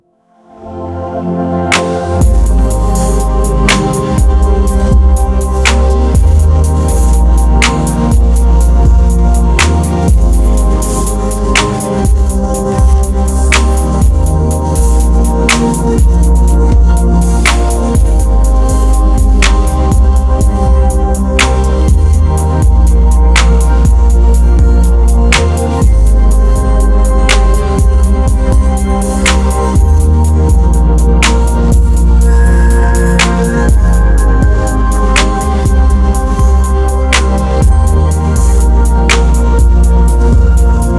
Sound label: Ambient Techno meditation 808 drum machine 808 kick claps shaker synth; Scandinavian Forest; synthesizer